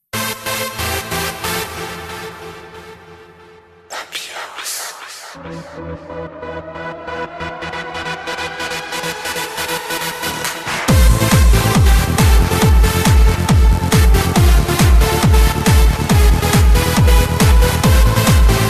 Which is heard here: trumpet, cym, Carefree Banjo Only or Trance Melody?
Trance Melody